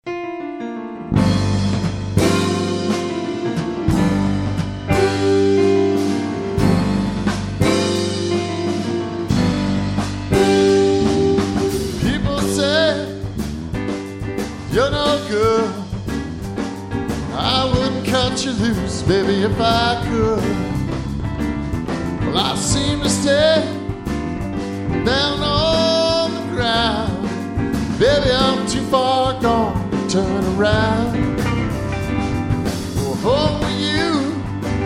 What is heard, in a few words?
blues